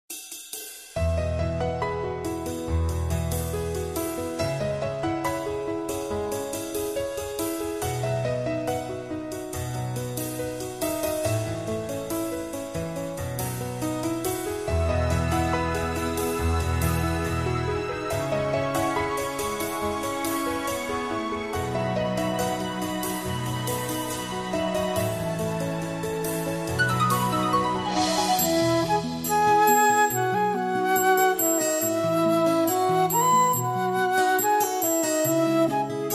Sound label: X'an Devotional